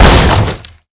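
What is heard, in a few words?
door wood kick; Doors; ModernWarfare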